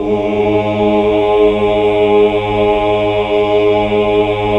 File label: OOH, OOOH Oct; Sound & Vision - Gigapack II CD 1 (Roland)